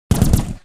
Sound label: gun; VAPEN; KRINGLJUD